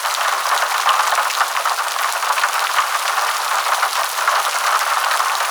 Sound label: Shipyards Rain Hard Surface; Exterior; Warframe; Grineer Shipyards